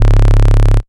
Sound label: Bass Shot C#; Mutekki Media; Vengeance Electroshock Vol.2; Bass Shots